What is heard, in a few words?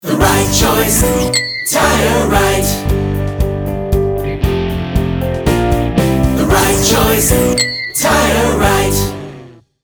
Ringtone short